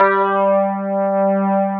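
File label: Wurlitzer G3, Keyboards Old School; USB Soundscan vol.09; AKAI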